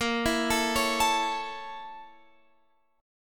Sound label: Bb Minor Major 7th Flat 5th, Guitar, Open F; BbmM7b5; arpeggiated; x,17,16,16,16,16; JGuitar